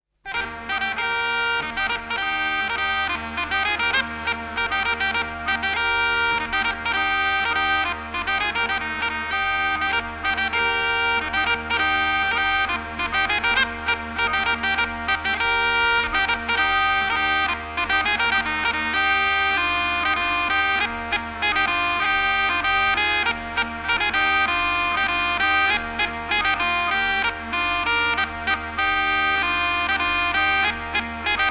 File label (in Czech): dudacka polka